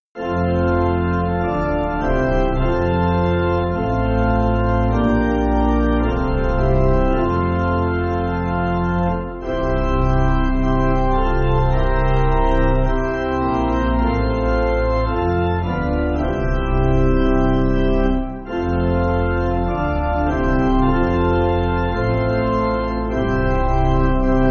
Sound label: Pipe, Pipe Organ, Backing Files for Churches; Absent From Flesh; Repentance 8.8.8.8